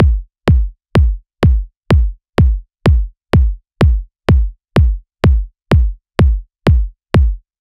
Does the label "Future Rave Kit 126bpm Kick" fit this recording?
Yes